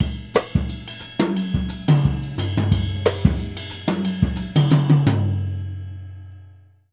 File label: Jazzistic; Univers Sons